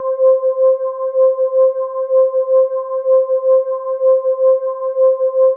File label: C5, synth basses; Music Store Sound Service; Einstein